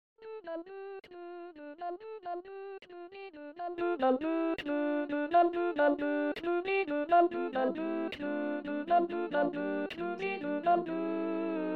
Alto SATB

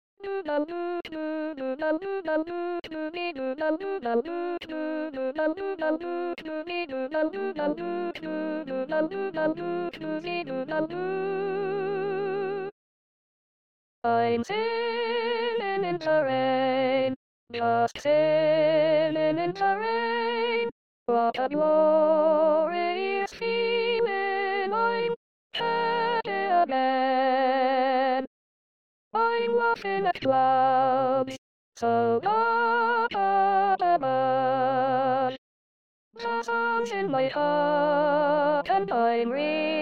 Soprano SATB Eb

